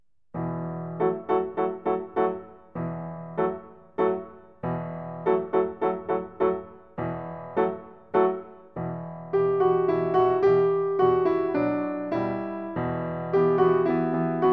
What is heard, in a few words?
Afghan melody; Piano; Fourth Way